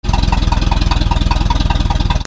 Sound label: BOATS